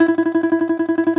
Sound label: DOMRA, Segundo Domra, Plucked Strings